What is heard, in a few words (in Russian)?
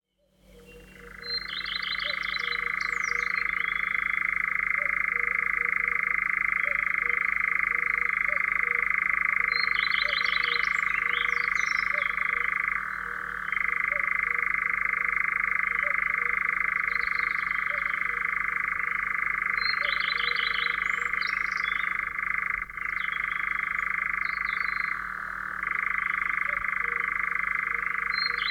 zvuki ptic